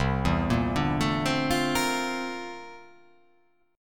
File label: C 13th C down; C13 arpeggiated